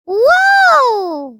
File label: Caramel Miss Sound; Jolly Battle